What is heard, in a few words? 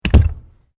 Weights Dumbell Put Down; Gym weight lifting equipment; Sound Effects:Sports